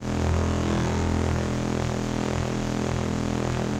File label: ship engines; World Of Warships